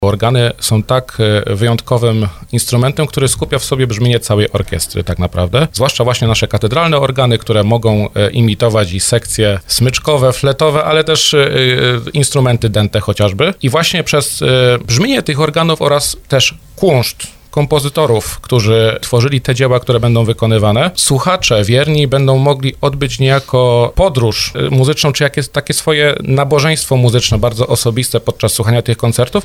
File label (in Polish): organy; tarnowskiej bazylice katedralnej; festiwal muzyki organowej